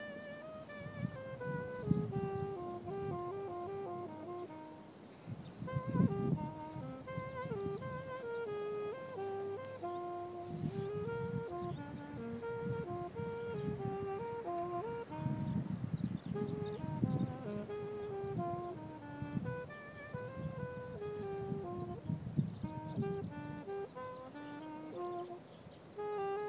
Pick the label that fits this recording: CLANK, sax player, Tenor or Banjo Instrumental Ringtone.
sax player